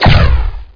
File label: firebolt; Hexen 2; assassin